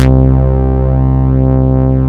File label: poly flange; Rubber Chicken Software Sample Bank